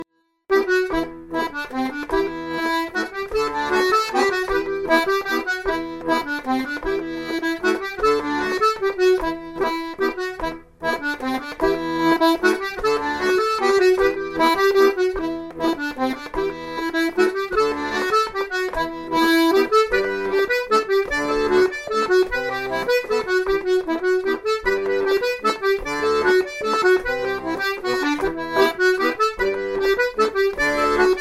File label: mazurka; Accordéon Diatonique